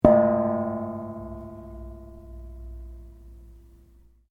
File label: Cymbal 6 inch bent, cheap brass cymbal; Musical; Cymbals; SoundEffects Foundation2